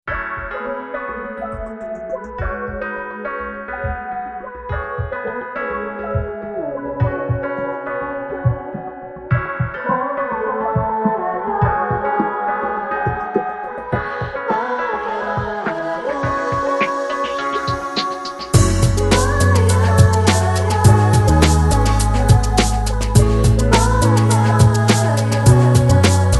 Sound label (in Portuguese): Bossa De Saudade